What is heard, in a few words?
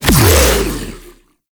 Regurgitate Impact A; Warframe; Grendel